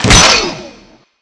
chainsaw slash; weapons

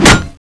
lance wall metal, weapons

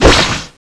magic knife slash

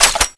mine shoot ready, weapons

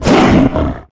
wolf falling attack; wolfteam

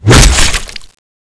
knife stab

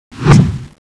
summon slash, weapons

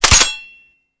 kf wall; drakar

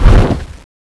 wing; CSO Cronowing; cronobotics54

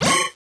bunker buster target siren

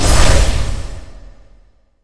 divine titan throw

